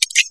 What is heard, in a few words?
colt clip out